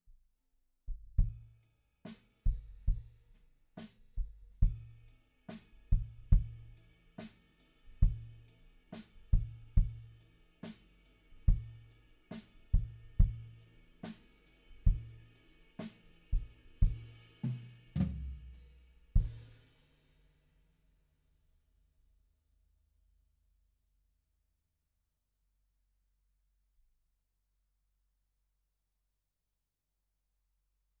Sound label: Kick In